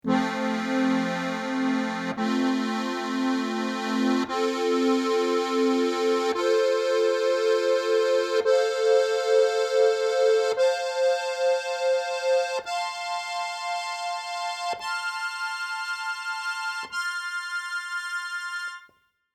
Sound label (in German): GCFB Akkord, harmonika